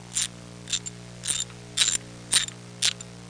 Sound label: SOCKET W, TOOLS; Easy Personal Web Page